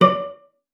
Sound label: CELLO FINGER; 90 s Sample CDs; Xsample VOL-5